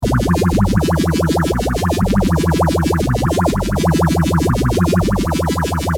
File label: MKS50 phaser